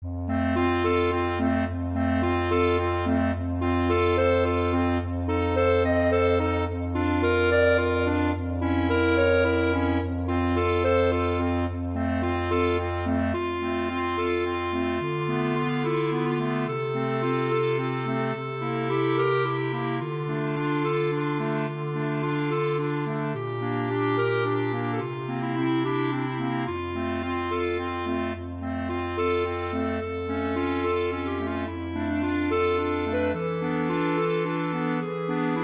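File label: Winds, clarinet quartet; Wedding; Ave Schubert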